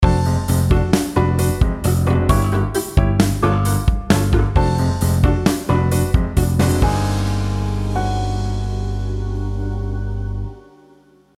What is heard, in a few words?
chromatic polychords ending